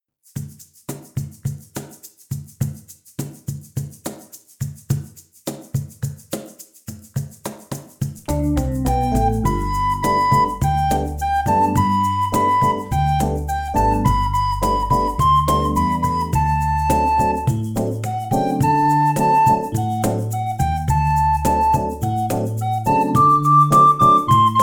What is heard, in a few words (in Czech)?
flétna + elektrický klavír + bicí; Riki-tiki-šuba-duby; Flautoškola 2 – METODICKÉ NAHRÁVKY a DOPROVODY